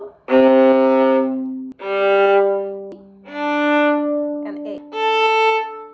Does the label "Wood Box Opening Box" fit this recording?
No